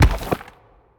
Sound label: symbol Landed; asgardian stones